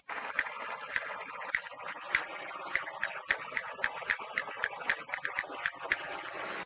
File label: Castanets Violin Applause